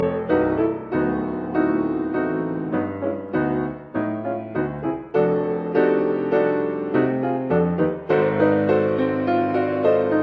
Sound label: Piano Sonata Moderato alla marcia